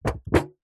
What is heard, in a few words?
metal tin lid flex bend; zapsplat